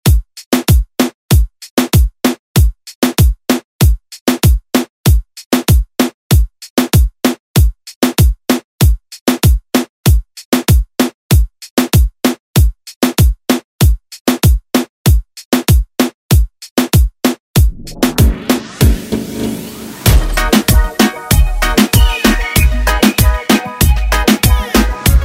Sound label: Latin Redrum